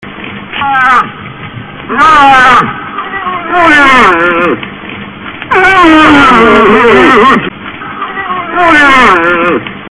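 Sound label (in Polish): łoś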